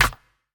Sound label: Layered snares